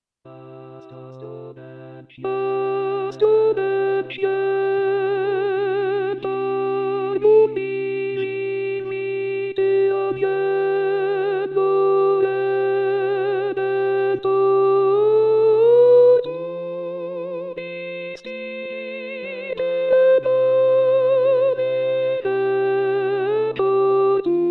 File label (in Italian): Tenori; Astro Del Ciel; Gruber; natale